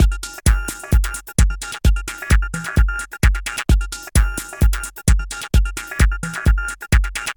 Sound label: Beat; CRTIV Shumovick